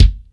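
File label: bass drum pop; 300 Drum Machines; Yamaha Motif ES-Series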